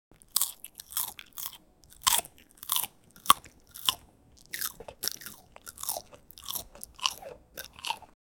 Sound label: chew asmr, eating